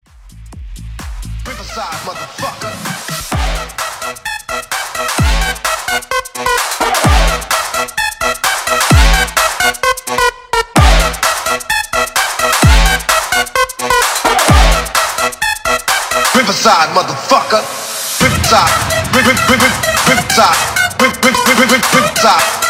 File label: break bootleg 129 bpm